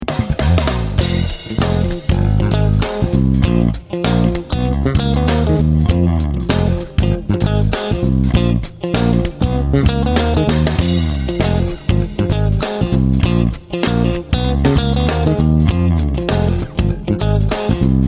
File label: Funk Riffs